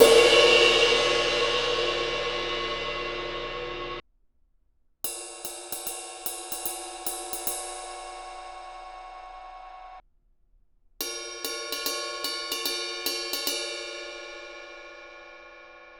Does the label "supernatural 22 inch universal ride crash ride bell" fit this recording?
Yes